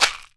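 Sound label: axatse shaker, African set percussion; Voice Crystal - Steve Reid Definitive Percussion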